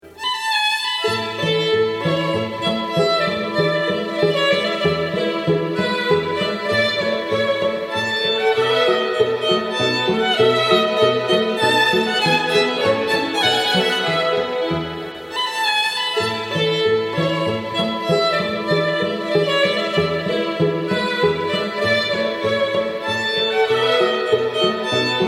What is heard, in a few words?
Minuet